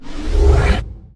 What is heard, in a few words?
Scifi Dome Shield Deactivate; SFX Kit; Energy & Forcefields